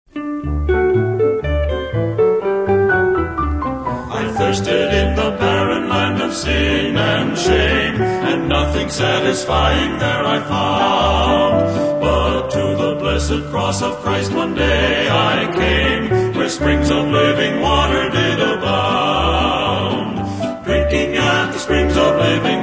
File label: Quartet